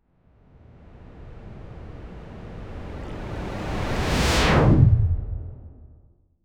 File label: smooth fast whoosh transi